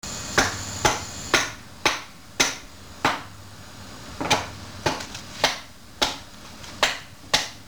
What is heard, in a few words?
knock knock